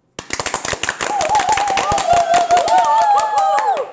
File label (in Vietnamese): vo tay